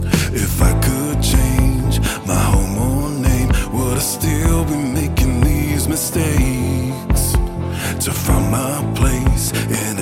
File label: Singing Rap